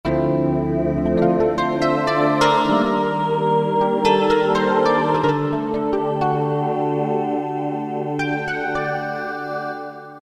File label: Ballad Piano Choir; Roland PN-D50-04